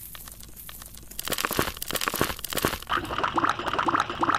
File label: soil in water